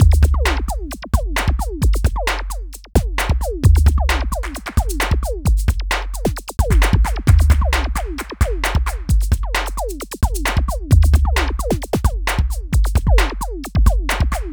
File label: Beat, Drums, Eclectic Electronica